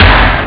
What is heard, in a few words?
snare